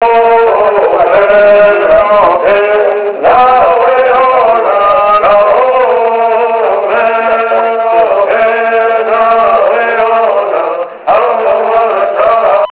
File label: Bird